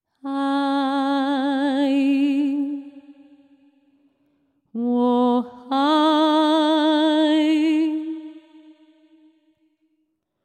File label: Washing waves